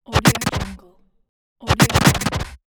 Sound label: futuristic gun shot